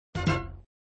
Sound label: Sound Fanfare End of Ball, flipper